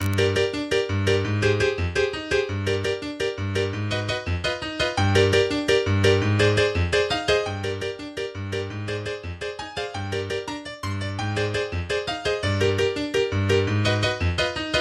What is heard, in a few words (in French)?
exercise pour piano